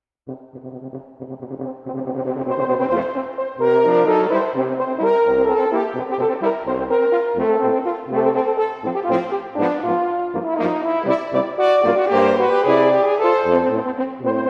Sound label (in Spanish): Austrian Horn Cueca